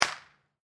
Electric Spark Single, Single electricity spark arcing; LYN111 package of Electricity sounds; Electrical Sound Effects